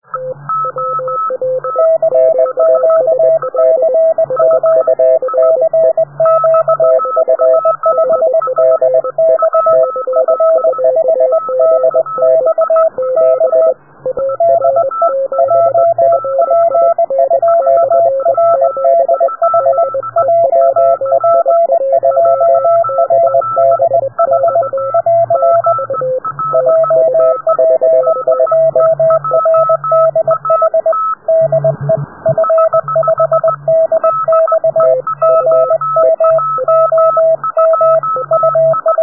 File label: noise